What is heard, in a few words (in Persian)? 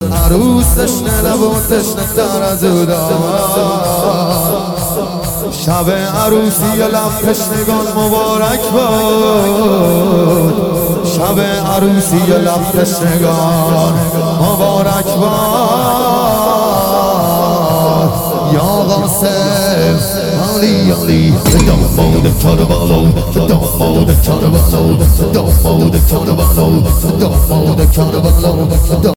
مداحی